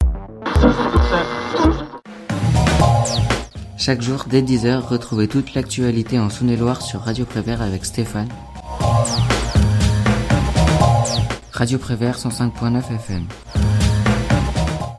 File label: jingle; radio prevert actu